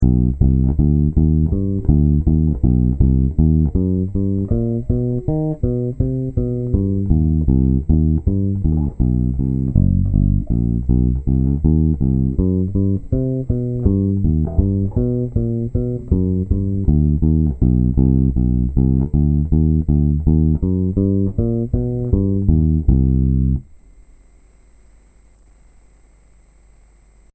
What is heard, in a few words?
jazz bass